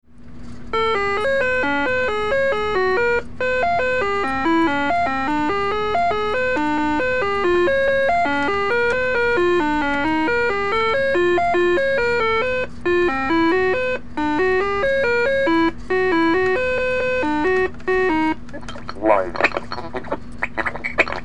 music and glitch

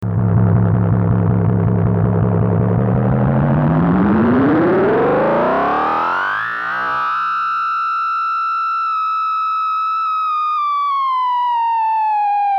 spaceship feedback

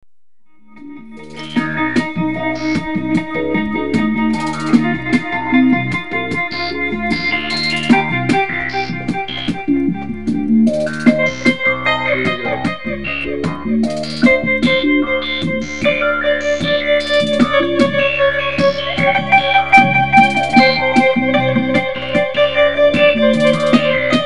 tight fat tuesday funk

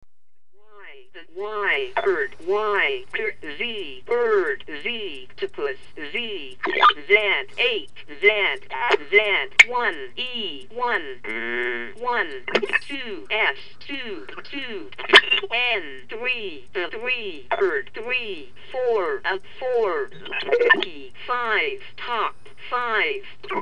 glitch

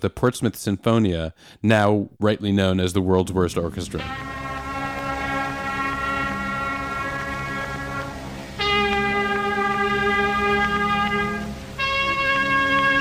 worst orchestra

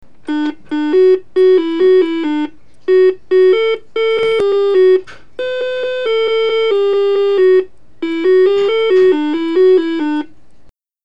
humpty dumpty song

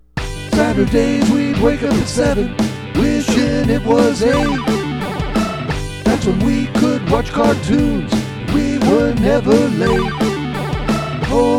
saturday morning with vocals

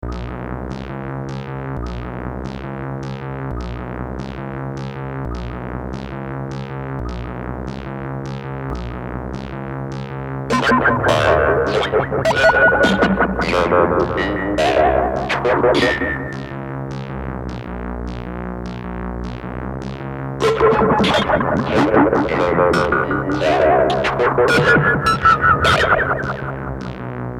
garbled timely robot

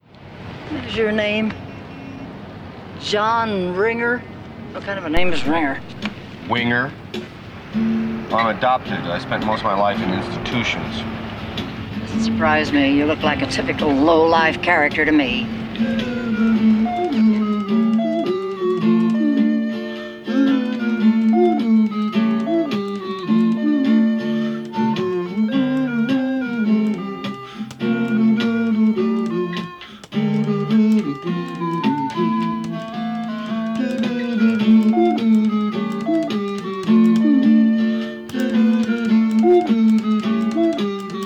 casio; movie lines

